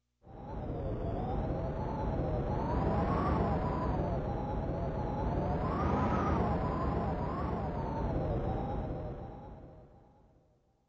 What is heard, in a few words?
Wind Prop